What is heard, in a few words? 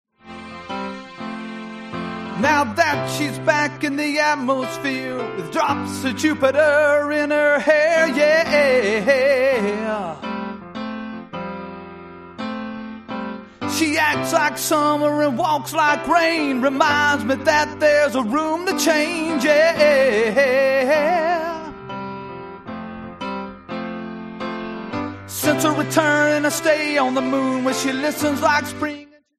play along drums rock pop and blues